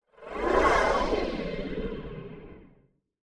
Sci Fi Spaceship; Spaceship Small Fly By; Spacecraft

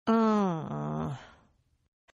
Groan Female; Groaning; Sound Effects:Voice; Partners In Rhyme

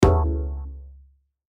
BUTTON HIT SWEEPER; Multimedia; Buttons

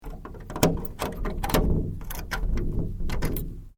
Door Wooden Old Latch Unlock; Various doors; Gate Sound Effects; Gates (Sound Effects:Doors)

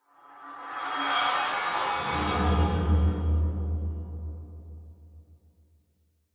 Cymbal Ascend Rear To Front; Hit; 5.1 STEREO MIX; Blastwave

